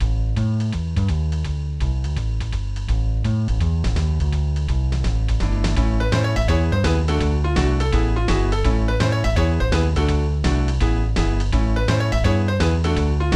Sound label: JAZZY